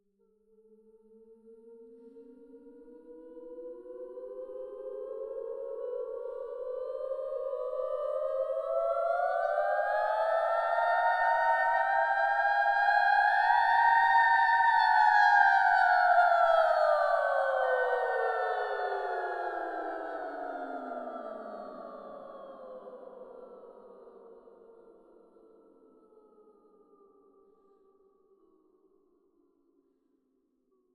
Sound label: Rise And Fall Vocals